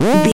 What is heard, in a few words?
retro jump bounce